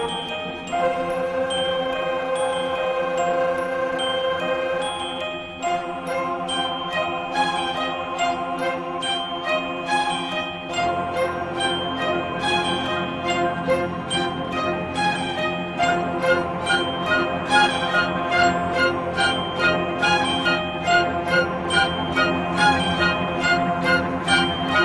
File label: 7 Notes